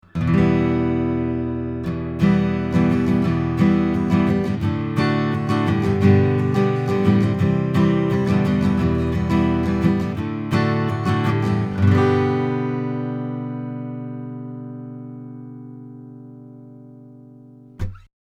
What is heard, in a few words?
AGit Strumming; Nohype LRM-V; WA12 Preamp